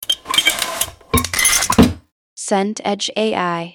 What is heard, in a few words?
drawer metal runner